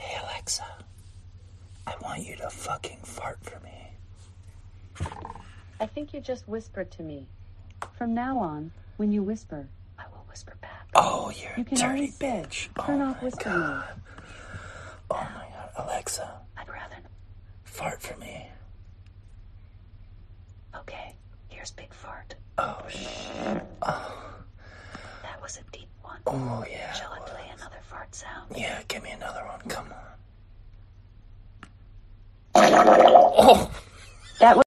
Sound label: alexa fart